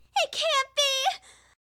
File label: Gameover; Hilzartov; Fairy Size Doesn't Matter